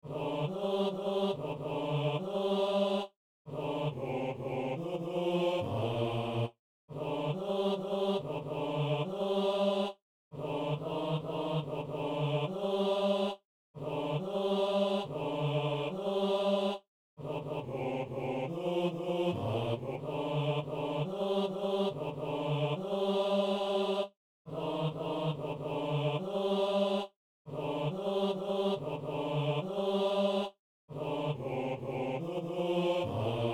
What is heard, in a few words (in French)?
hommes